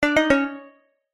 multimedia ui tone short bright organic generic notification